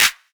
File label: clap sound b key; percussion single hits one shots